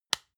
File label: lotion large lid close medium click; berufe; friseur